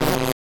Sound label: Static; Blastwave